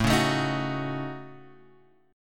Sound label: A 6th Flat 5th, guitar; A6b5 Chord {5 4 X 2 4 2} strummed